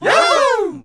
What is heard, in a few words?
SE GAME BREAK; maimai